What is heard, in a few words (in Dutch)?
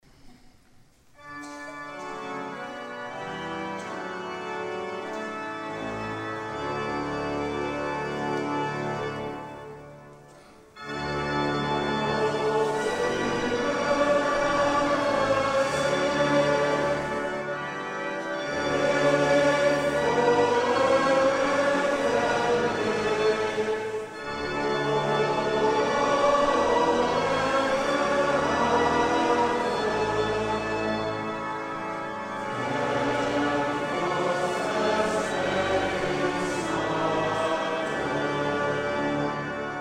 Zingen Ps 97; vers 7